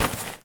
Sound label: gear armor drop